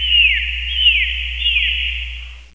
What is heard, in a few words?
whistles